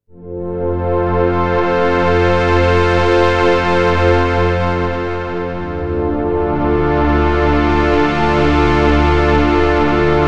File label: pad